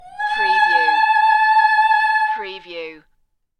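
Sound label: Female Opera La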